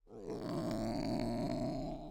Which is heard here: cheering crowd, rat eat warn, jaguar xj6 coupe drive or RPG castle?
rat eat warn